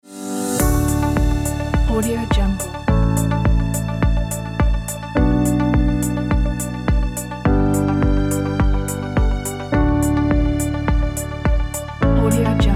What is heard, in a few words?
corporate logo